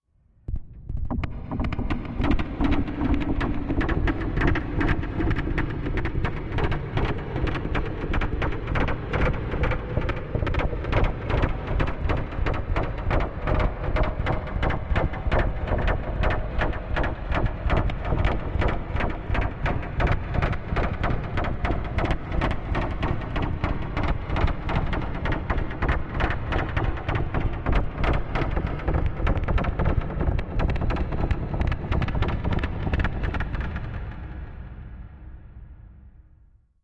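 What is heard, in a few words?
Machine Metal, eerie ambience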